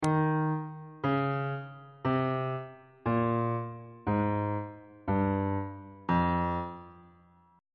f aeolian mode descending on bass clef

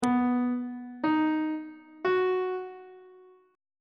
c flat major on treble clef; c flat lydian chord 1

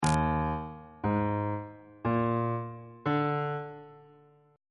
e flat major 7th suspended 4th chord intervals

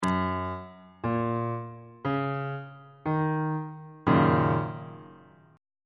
f sharp major 6th chord on bass clef

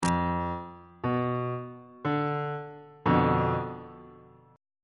a double sharp diminished 2nd inversion; bass clef

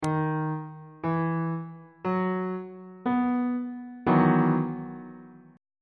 f flat major 7th suspended 2nd 3rd inversion

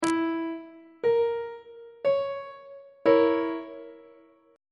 a sharp diminished 2nd inversion; on treble clef

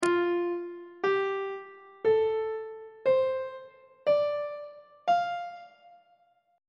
f major pentatonic scale; on treble clef

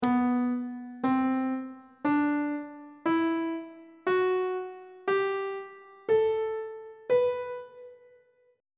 c flat phrygian mode on treble clef

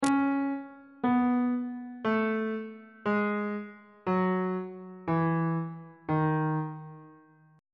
d sharp locrian mode descending on bass clef

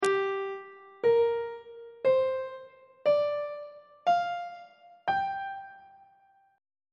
g minor pentatonic scale on treble clef

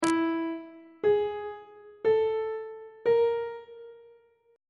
f flat 4th intervals on treble clef

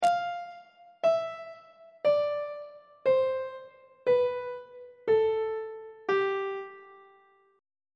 mixolydian mode descending on treble clef

